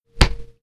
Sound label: Foley Punch Impact